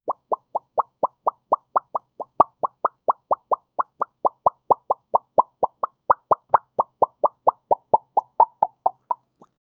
cartoon pop sound bubble

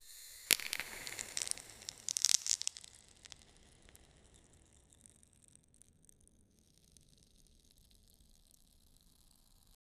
earth being cut in two, lava

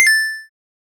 a playful and satisfying, 16-bit

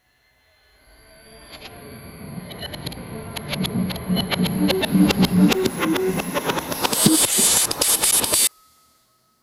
a sharp time slice sound; time manipulation

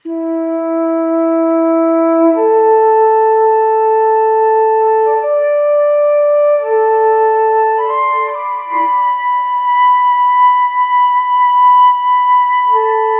music slow mournful flute; ethnic

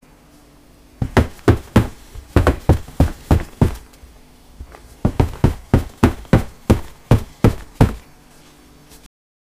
jumping on bed sound

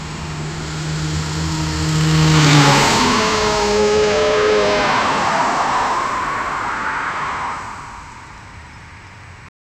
hyper realistic fast car

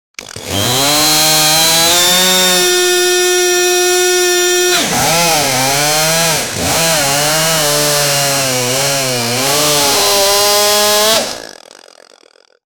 a sound effect with a, chainsaw

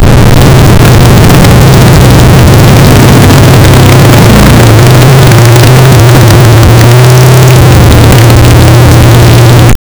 motorcycle podcast intro

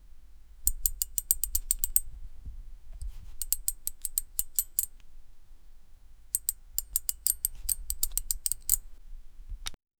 seat belt unbuckling; buckle

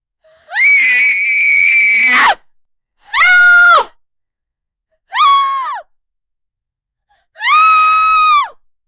description a short sharp, human vocal

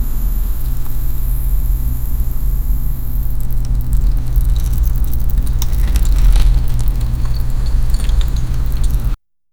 a flower that gently open

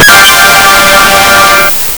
a completion task sound, reward

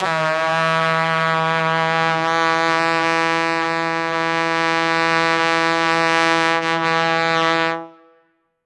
trumpet blown heavily; brass instrument

